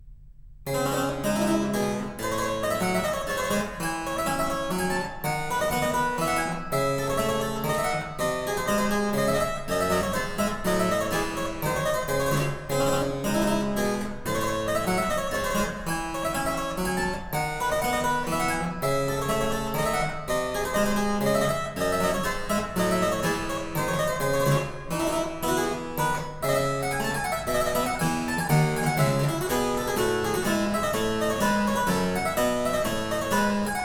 Round O, Harpsichord; Purcell edition

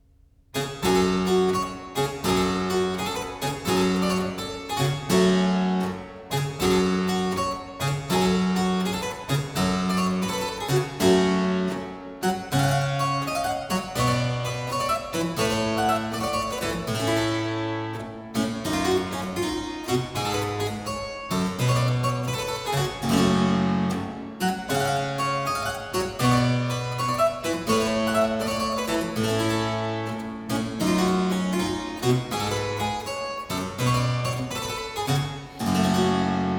Jigg, harpsichord; Complete Chamber Music; Brilliant Classics; Miscellaneous pieces for the harpsichord

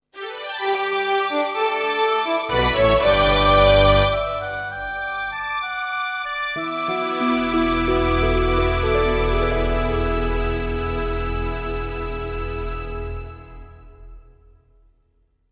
Fanfare; FFT OST

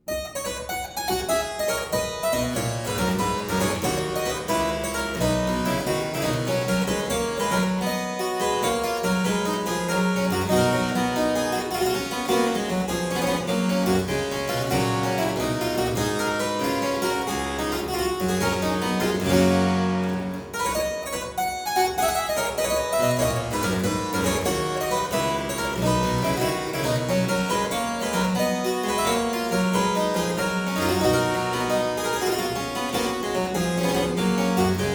Suite in C major Gigue, Harpsichord; Buxtehude; Complete Harpsichord Music

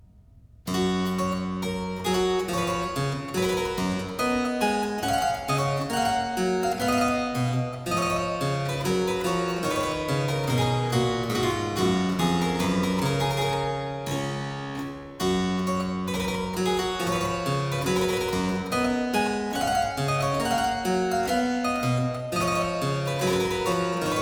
Air, Miscellaneous pieces for the harpsichord; Complete Chamber Music; Brilliant Classics

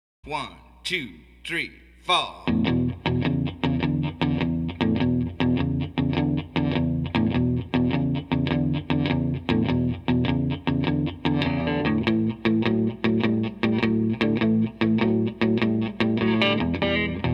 E March, harmonica; Blues Harmonica Masterclass

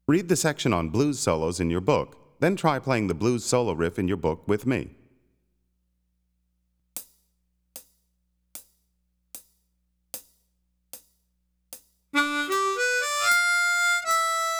Soloing on the Blues, harmonica; Play Harmonica

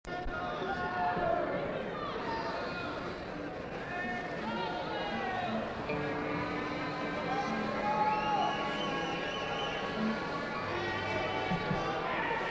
Crowd & Tuning; Live, New York City,ny

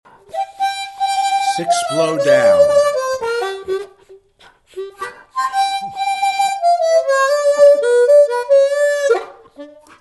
5 Blow Down, harmonica

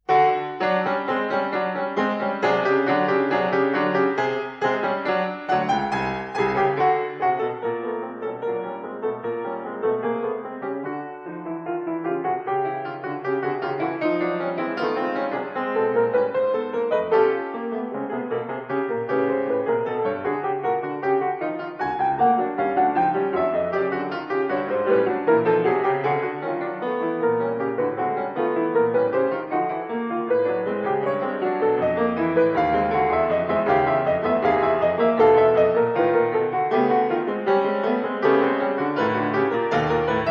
solo piano music, Chromatic Invention; Юдина; Наследие Марии Юдиной; Bartok; Microcosm

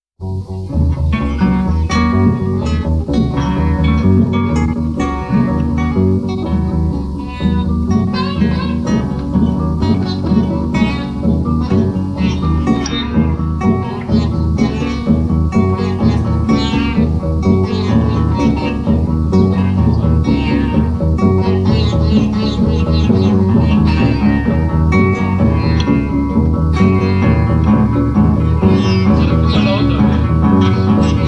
Improvisation; Gurnemanz; Fair Margaret and Sweet William

